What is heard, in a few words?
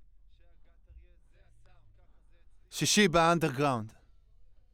Voc OD